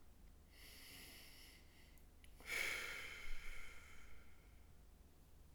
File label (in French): soupir; Hadjithomas Joreige